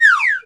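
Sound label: Slide Whistle; Roland SPD-S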